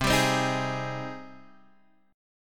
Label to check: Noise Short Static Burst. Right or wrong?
Wrong